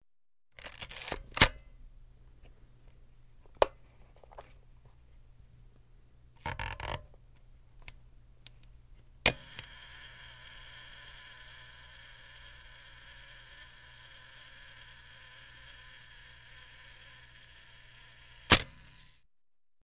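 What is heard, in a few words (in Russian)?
автоспуск фотоаппарата взведение пуск щелчок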